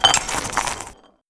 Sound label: glass breaking